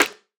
Snare D#; Drum One Shots; Snares; Cymatics; Eternity Sample Pack

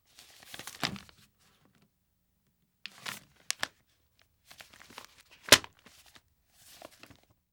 Magazine Handle; Fairlight Sound Lib

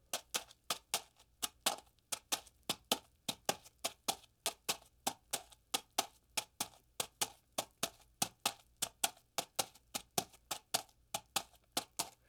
Horse hooves gravel; Fairlight Sound Lib

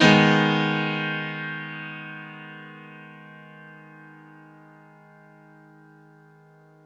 One Shot Piano 140 BPM (G# Minor), Grand Piano; Instruments; One Shots; Ghosthack; Guitar And Piano Samples

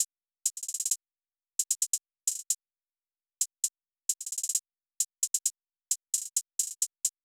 Drum Loop 132 BPM Hihat; Loop Stems; Cymatics; Vibes II Drum Loop Collection; Vapor

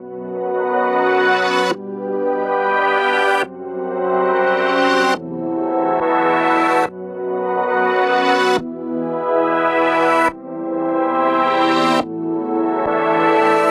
Riddim Melodic Loop E 140 Sideways; Ghosthack; Riddim Essentials Volume 2

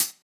Closed Hat; Drums; HiHats; Ghosthack Advent Calendar 2017 Day 5

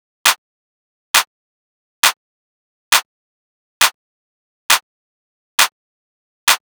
Clap Loop 135, Techno

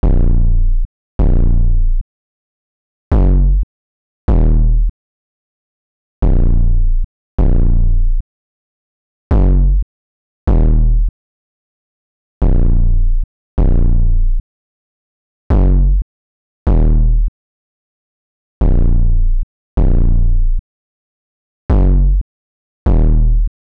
155 BPM A# Min 808; Melodies; Loop Stems; Cymatics; Celsius; PHARAOH Beta Pack; Pharaoh Premium Drum Samples (BETA)

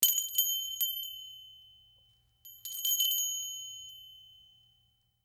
Bells; Fairlight Sound Lib

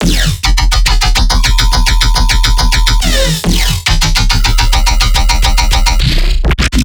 Riddim Bass Loop E 140; Trilobite; Ghosthack; Riddim Essentials Volume 2

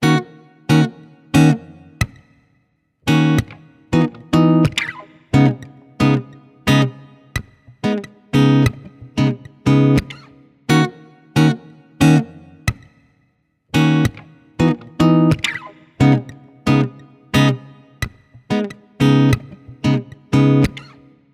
Guitar 90 BPM (Ebmaj); Guitar Loops; Forever; Ghosthack; Guitar Loops & Shots

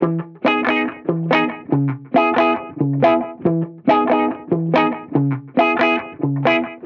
Reggae Guitar Loop 140 BPM E Maj; Vibrations; Cymatics; Vibrations Guitar Sample Pack

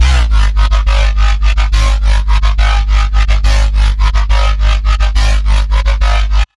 Bassline C3 140, Dubstep; GhostHack

